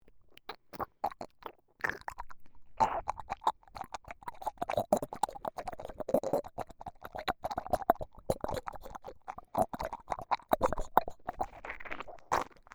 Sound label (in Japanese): 左右同時耳舐め